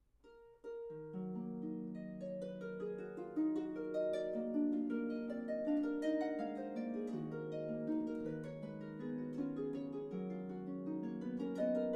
Solo Harp; Classical-Harp; Bach Lute Suite

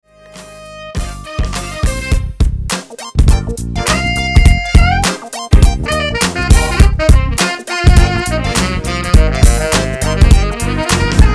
Saxophone

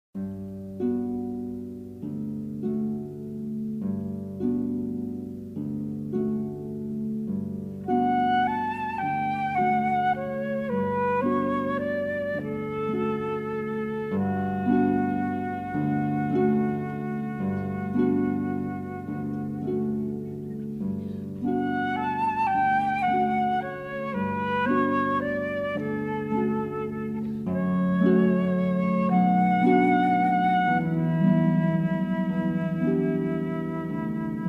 String Ensemble

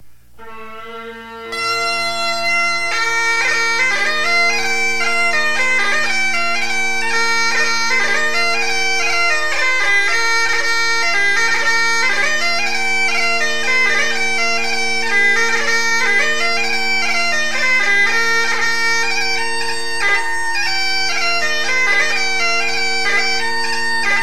Bagpipes

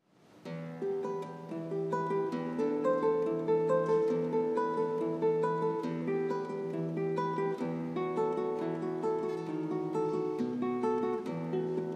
prelude; Classical

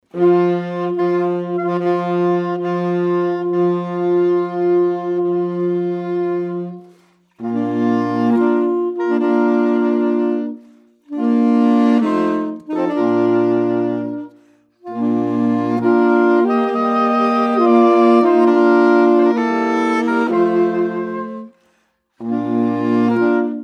Sax Quartet; Here Comes the Bride

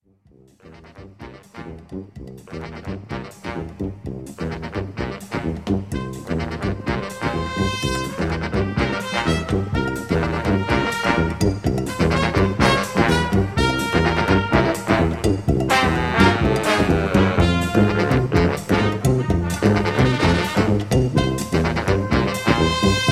Brass Band; jazz band

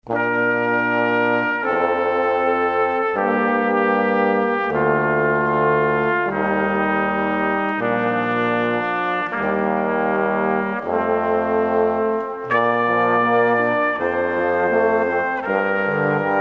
brass quartet; Pachelbel Kanon

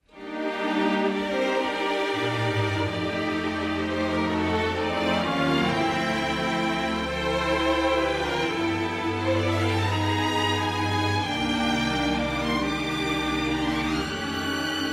Saxophone; David Turner Music Ensembles

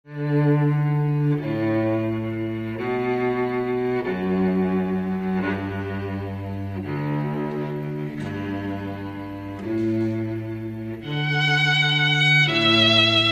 Pachelbel Canon, Classical

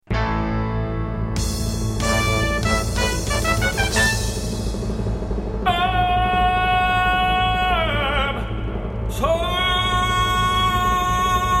Impersonator; Elvis Impersonator

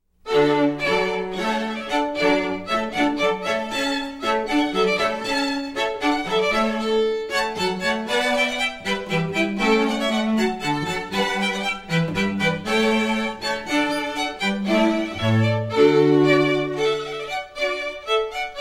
String Quartet

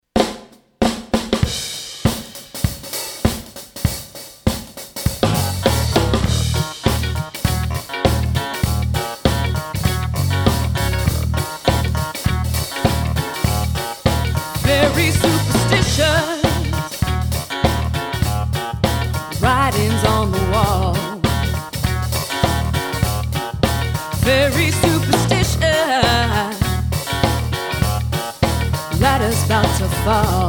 Soul Motown, Jazz Band

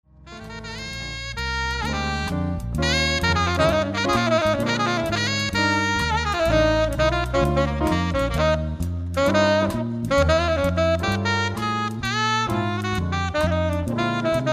jazz band

